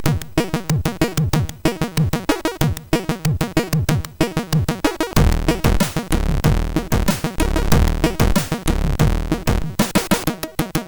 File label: Short Muzak, MOS6581R4